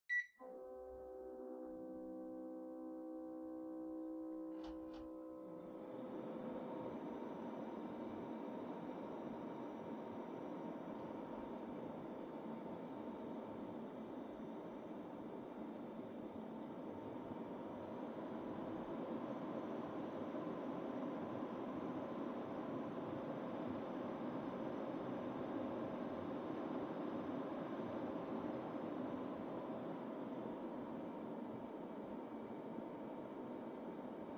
Air Conditioner Far All

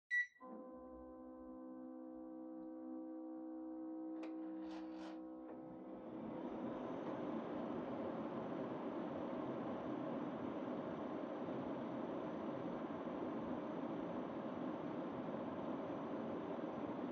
Air Conditioner Far High

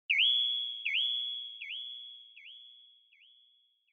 Onoma Flash Low Delay; オノマトペ 光る; 低 ディレイ

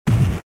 Fighting Fall

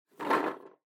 Drawing Box Shake

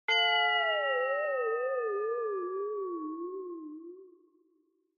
Onoma Negative Long; オノマトペ ネガティブ; 長

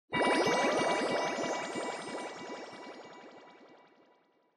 Scene Fantasize Long

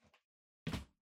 Typewriter Far Put Down

